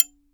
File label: TRIANGLE CL, BRAZIL